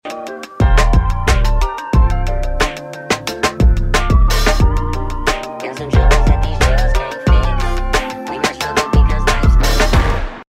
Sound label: bomb ten sec count